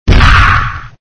SFX sound (alien big punch)

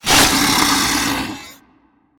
Voices Mob death; Mob byivol

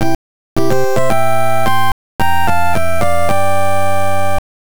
Round Clear; V-Tetris; Virtual Boy

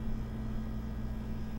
Freezer

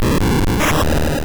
Skull Bash

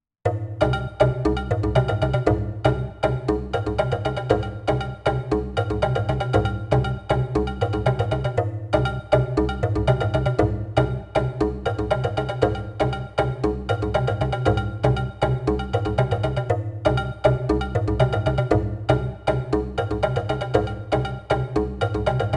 Tribal; Kid Pix 3 Deluxe; Windows

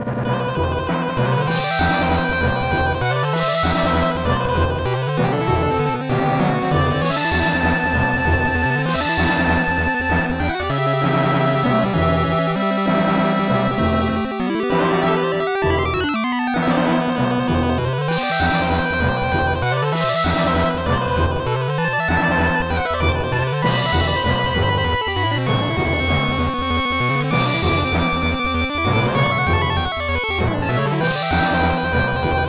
BGM; Adventure of Tokyo Disney Sea; GBA

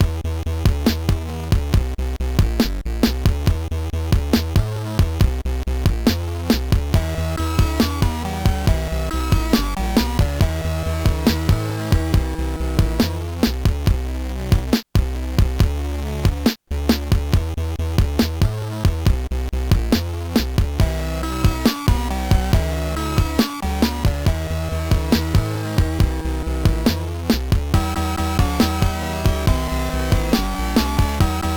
3 Ball Multiball Prelaunch; Dungeons & Dragons; Bally pinball

